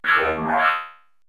FX VOX; AMG - Vince Clarke Lucky Bastard

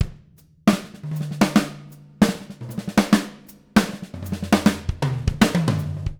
154 PUSH, NTPASS, Living Drums; Sampleheads; Peter Erskine

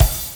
Bd Hat, Kick Drums; Zero-G; Soundclash

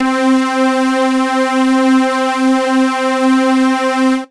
Synths Note C

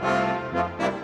Brass; Sonic Foundry (Sony Creative Software); Orchestral VOL-2; Modern 31

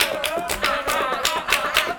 120 CHANT

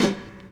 Snare FX layer

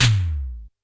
F2 - E Tom, Yamaha PSR-E323 Electronic Kit

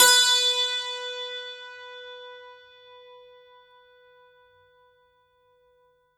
santoor, b3; PS-52 AIFF WORLD INSTR 2; STRINGED INSTRUMENTS; PS SANTOOR SABERI

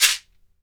SHEKERE, Synclavier Percussion; World & Orchestral; ILIO

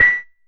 Perc Hit, Noise

